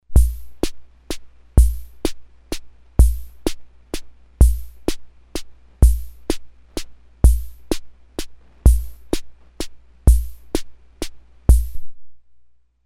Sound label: WALTZ, Ace Tone Rhythm Ace FR-2L